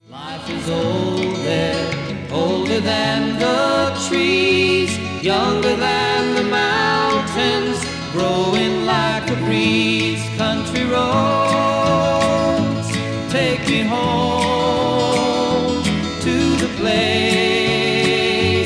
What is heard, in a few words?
music amb